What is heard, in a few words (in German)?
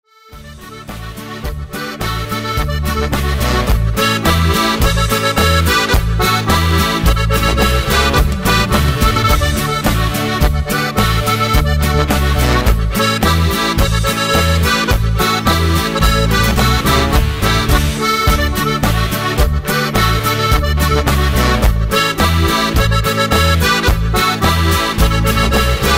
Boarischer